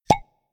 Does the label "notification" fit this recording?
Yes